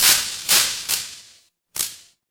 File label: fire electric spark